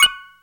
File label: menu click; Bomberman